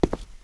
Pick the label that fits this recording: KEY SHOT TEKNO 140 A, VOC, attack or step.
step